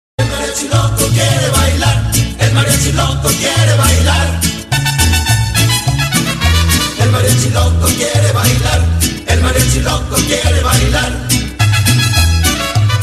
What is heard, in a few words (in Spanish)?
una cancion espanola tono de llamada